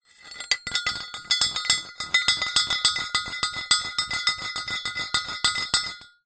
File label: wood thin pole circular movement inside metal tube close up ring; foley